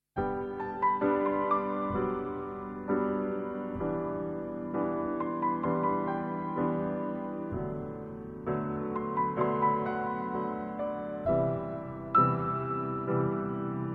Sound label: Pianissimo (Acoustic)